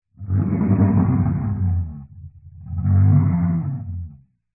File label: dinosaur large growl distant dull